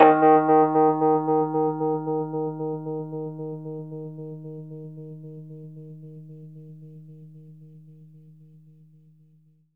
Rhodes fast D#, 3D Keys; 3D Sound; 3D Keys VOL-1

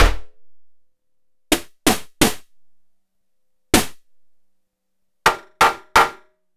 Snare High Quantity

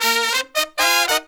132, Pop & Funk Brass

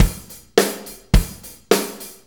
4-4 Loop, Drum Loops, Full Kit Loops

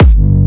Jump Kicks, Bassdrums

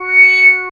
f#, Analogue FX, Dance Synths; Samples vol.37 - Dance Synths

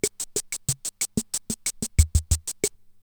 SAMBA, Eminent-Solina Rhitmix drum machine; 300 Drum Machines

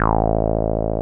303 OW A2F, 303 OW BS; Drums In Your FACE!

